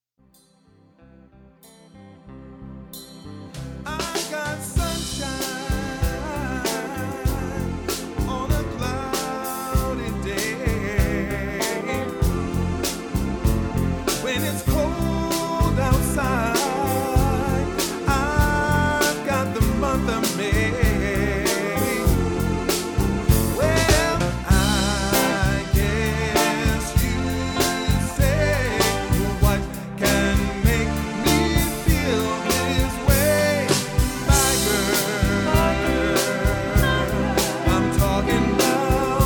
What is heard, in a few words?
Motown; Motown-Band